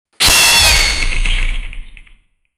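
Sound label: bloody slash with a katana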